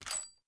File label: m67 spoon; explosive; garrysmod